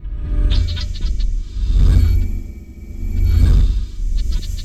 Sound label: TRANSVERSE, DANGER SUSP; Spectrasonic Distorted Reality 1